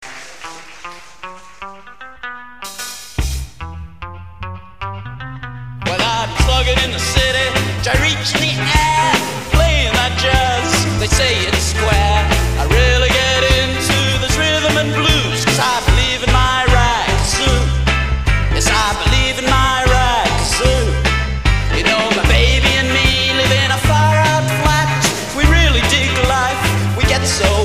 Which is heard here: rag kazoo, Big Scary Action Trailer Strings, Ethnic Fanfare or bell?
rag kazoo